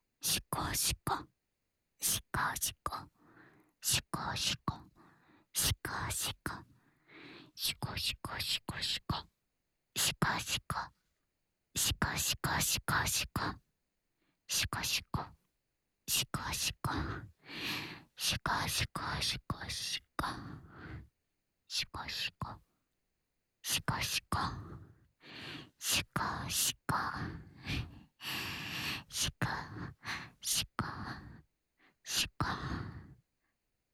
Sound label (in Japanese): 手コキ（しこしこ・左）（SEなし）手交